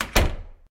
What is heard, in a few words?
door sounds open